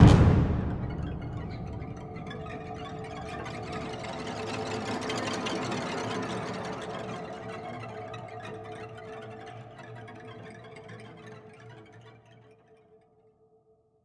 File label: Stingers - Tension; Planet Alpha